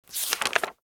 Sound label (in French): papier